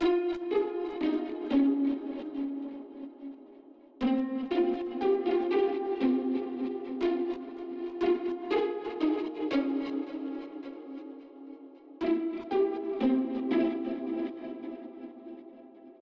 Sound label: Pizzicato; Toki Tori 2